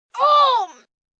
Pain Ohhm, Grunt, Impact, 1 child, short, just hit, intense, human voice, kid, groan, moan; VoiceBox